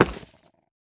下界矿石踩踏声

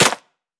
沾泥的 红树根 踩踏声

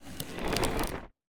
待机声; 嘎枝

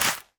甜浆果丛放置声

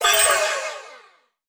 取走物品声; 悦灵

